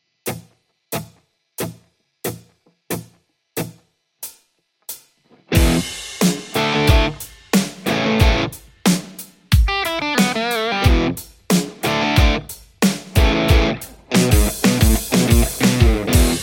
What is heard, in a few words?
Les Paul; poulin lecto